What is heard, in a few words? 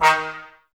brass, Tbs Mute